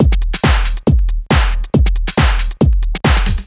Dance Drumloop

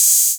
HiHat; Drums & Percussion; One Shots Drums

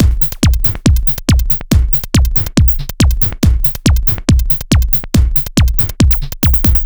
Dance Drumloop

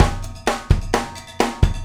Acoustic Drumloop

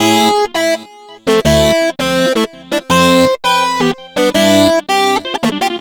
Synth

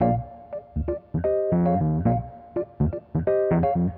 E. Piano

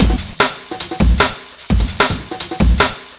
Breakbeat, Drums & Percussion

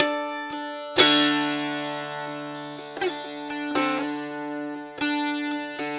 Dulcimer

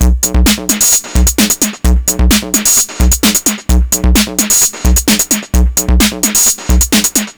Electronica Drumloop

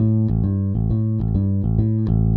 Electric Bass; Basses

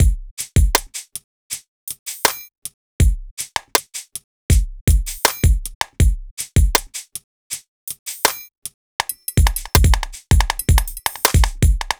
Hip-Hop Drumloop

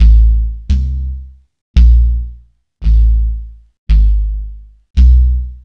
Synth Bass

